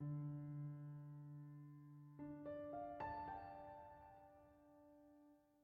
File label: Piano; Frozen Flame; windows